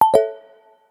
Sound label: Undock; Gigaset GS290